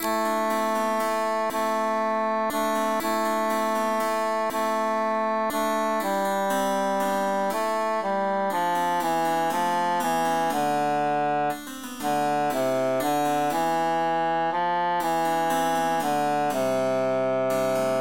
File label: tenor emphasized; son me regarde prennes i garde he mi enfant